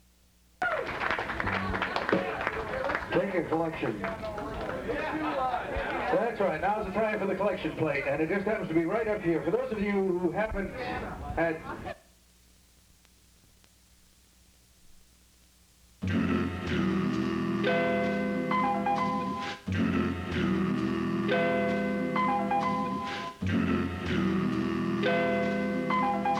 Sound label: Jazz Band